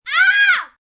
Scream Kid Short, Scream, child, boy, short, fear, terror (human voice); Screaming